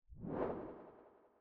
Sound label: woosh